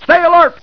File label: alert